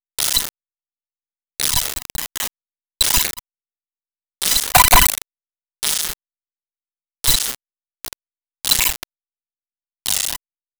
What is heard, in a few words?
sum cool glitches